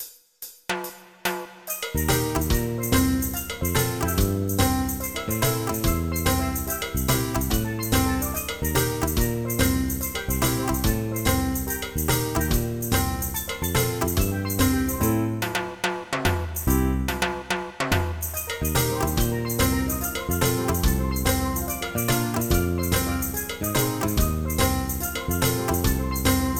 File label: Reggae